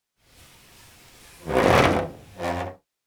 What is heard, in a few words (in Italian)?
finestra Open Close; Inquisitor 3